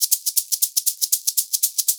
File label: 120 SHAK, Percussion Loops